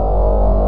drone hum

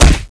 blunt hit bod

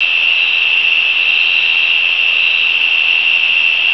Ambient Loop

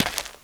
dirt, footsteps

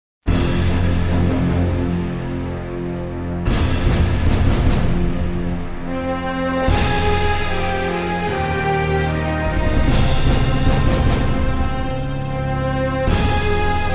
music

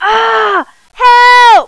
wound; fagent

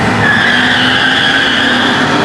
car level sounds

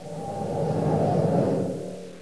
wind wail

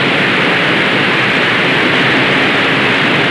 falls

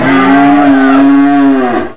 attack

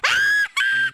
koro scream; korone

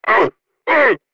die

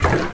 glug

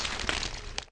zombie throat slit blood

